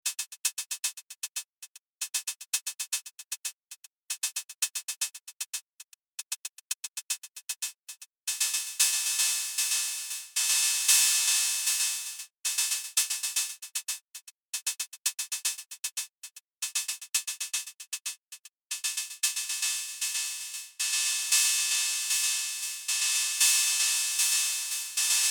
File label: HiHat Volca Beats